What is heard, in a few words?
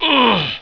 "oof", heroic; pain